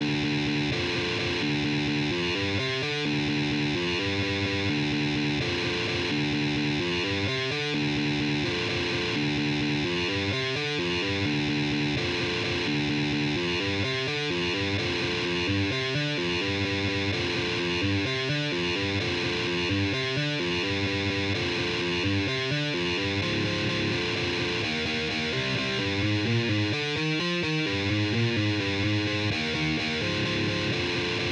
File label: guitar